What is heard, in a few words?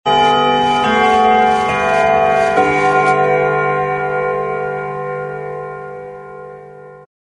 grandfather clock three quarter hour chime